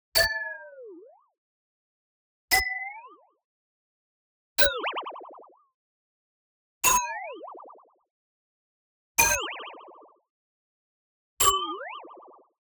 BLING Metal Synth Impact Warp; boom library; Anime Essentials

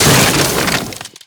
Shatter Ice Block; Destruction

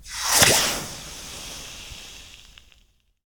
Steam Sizzle Hit

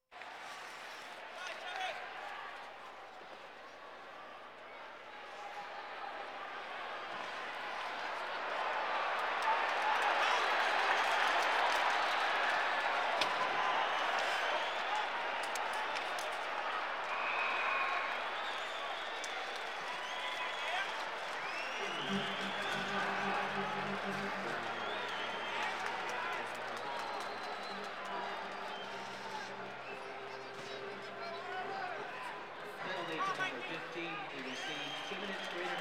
Sports crowds; Valentino Production Sound Effects Library CD50

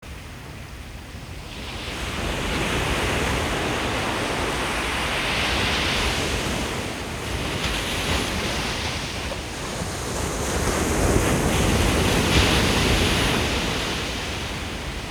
sea waves cliffs; best service Blue Box; sea ocean

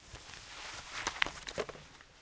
headphones off desk; Apotheorasis

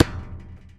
Street Ball Metal Back; SFX Kit; Street Basketball

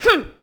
Boxing Female Punch Grunt

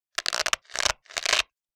Walkie Talkie Static End Of The Connection Noise; Hollywood Edge; sound designer's toolkit v2

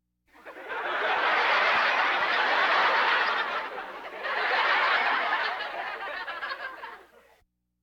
Laugh tracks

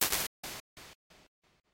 REV GATED DL, impulse responses

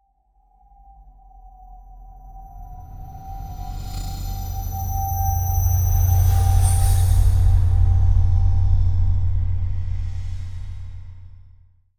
Drone Eerie Breaths Scary Logo; Hollywood Edge; sound designer's toolkit v2

